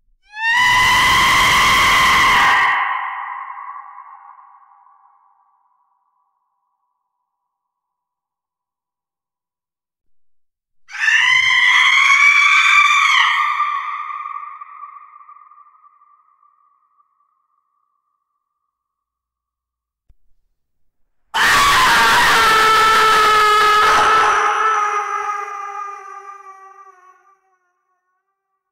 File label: scream scary sound effect; scp 096; effetto sonoro urla